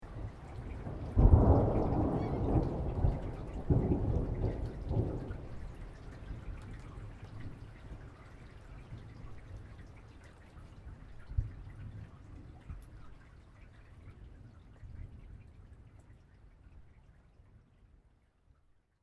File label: Thunder Distant Thunderclap Long Rumble Tail; Weather; Distant thunder roll.; Thunder Sound Effects